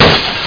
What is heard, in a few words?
GLASS; wacky